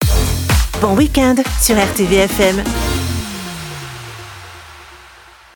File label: Jingles Weekend